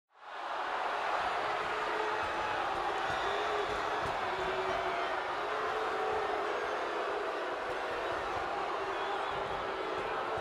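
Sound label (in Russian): недовольные болельщики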